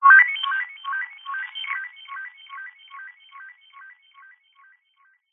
science fiction radio receiver transmission interference burst beeping static; decaying; zapsplat